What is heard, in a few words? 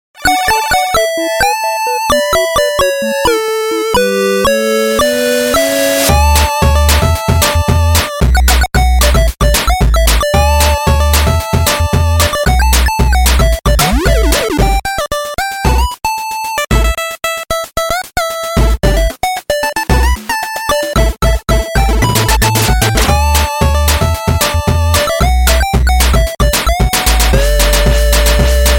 Ringtone 8bit; MLP